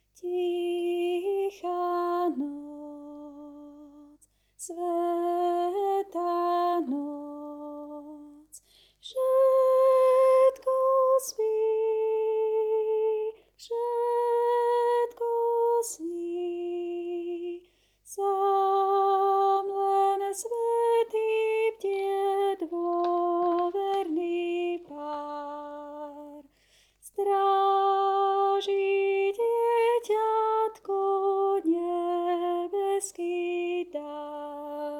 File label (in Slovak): Sopran; Vianoce 2019 - Tichá noc; Farnosť Letanovce